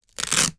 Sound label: foley velcro; sound effects one